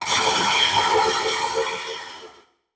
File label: Title Transition Electrical; Adobe; Production Element